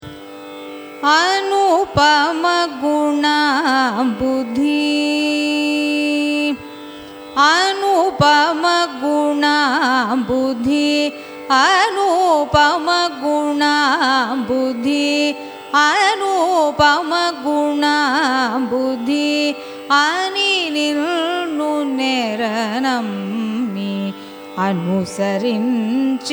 raga atana carnatic; anupama gunambudhi

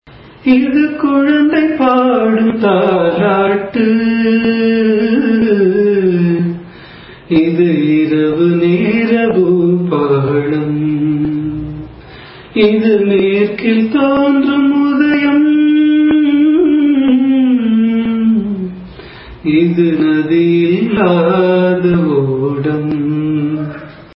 raga kapi cine; carnatic music

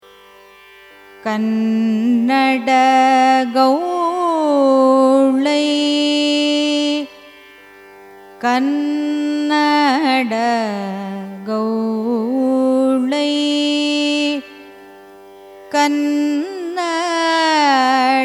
raga kannada gowlai signature; carnatic music

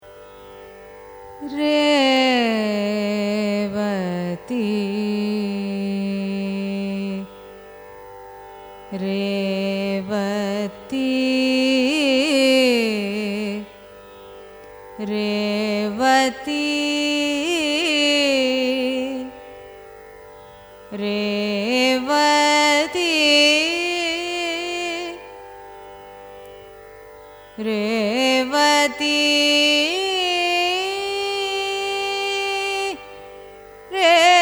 raga revati signature; carnatic music

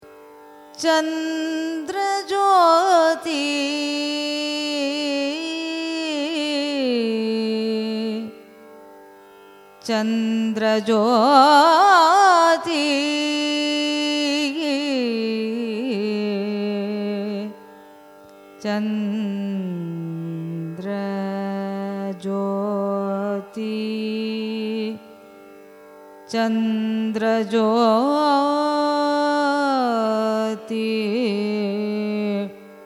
raga chandrajyoti, carnatic music

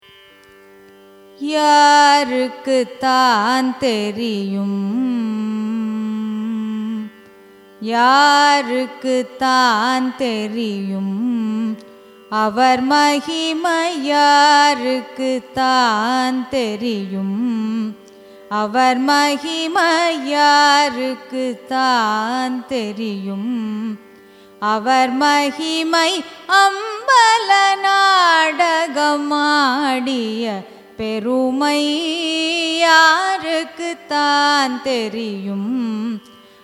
raga deva manohari carnatic

